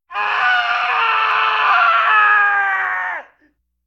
Schreeuwende Man (horror effects); KMP Film Video Sound Effects

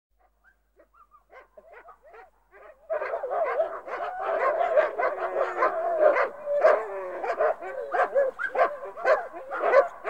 Hond Blaffende Groep; KMP Film Video Sound Effects

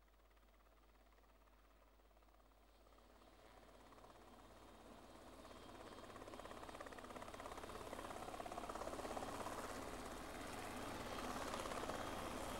Heli - Landt; KMP Film Video Sound Effects

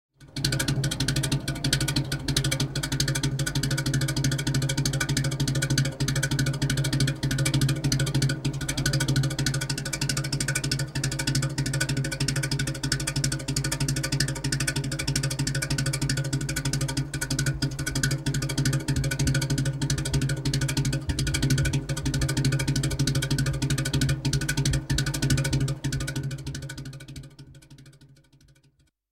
Antieke Auto Start; verkeer algemeen; KMP Film Video Sound Effects

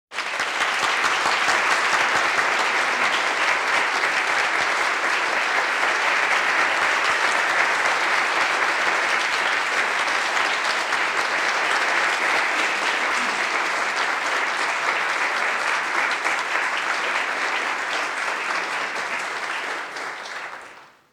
Applaus 80 Mensen, vrije tijd; KMP Film Video Sound Effects

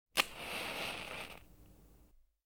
Afstrijken Lucifer; KMP Film Video Sound Effects

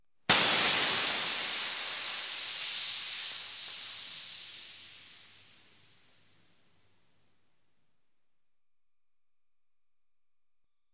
Fiets Lekke Band; KMP Film Video Sound Effects

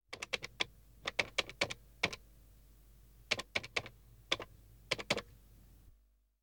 Druktoetsen Telefoon; KMP Film Video Sound Effects

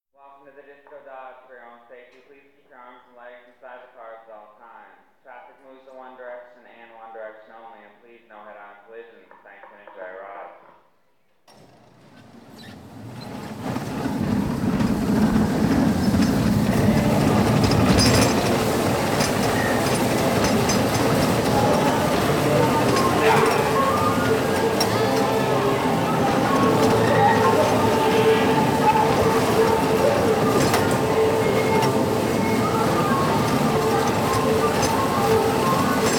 Botswagens Tijdens Rit; KMP Film Video Sound Effects

